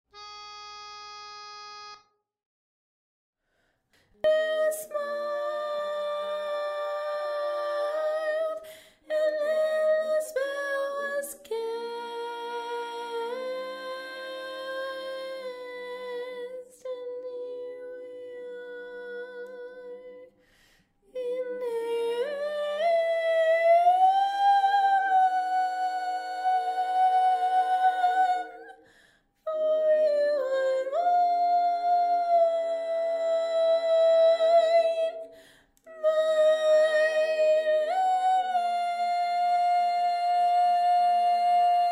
Tenor; Bars 40-50

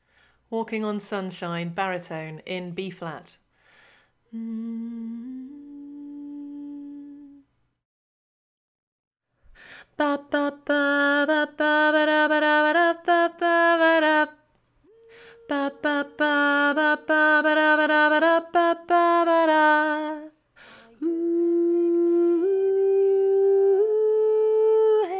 Baritone dominant, harmony

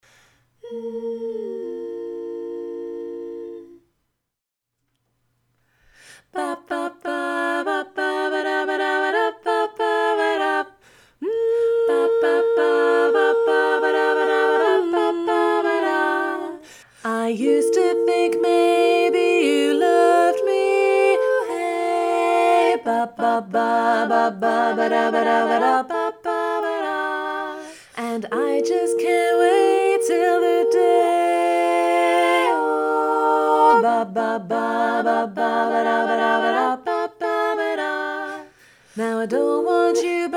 Bass muted, harmony

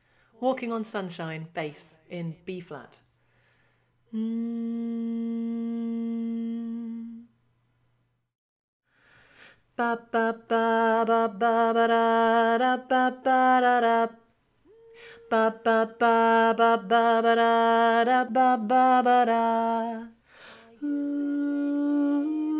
Bass dominant, harmony

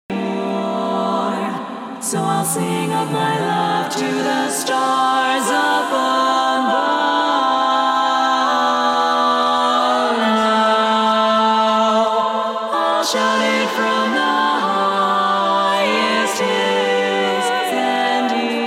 Lead mute, harmony

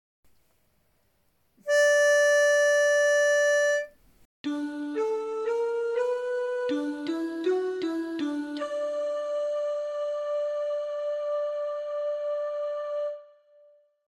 Tenor with pitch

